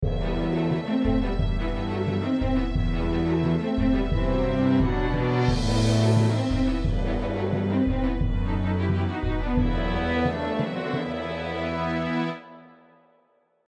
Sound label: Action Orchestration